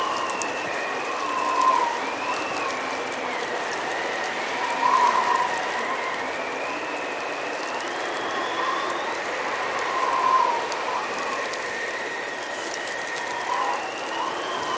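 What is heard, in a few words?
free spins ice ambience; wild worlds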